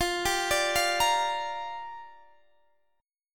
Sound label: G Minor 7th, F